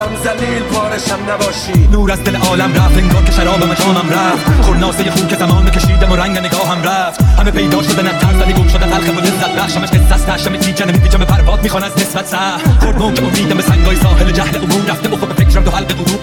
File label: Remix